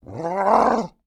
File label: Animal Mammal Carnivore Dog Bull Terrier Growl; animals; AdobeCC